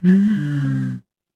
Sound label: ui power on